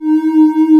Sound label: MATRIX 12; E-MU Formula 4000 Series Vol. 3; Analog Odyssey